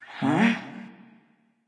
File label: confused; smite